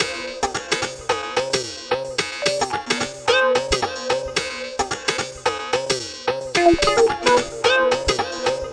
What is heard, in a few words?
resonating synth talk, synth and electronic loops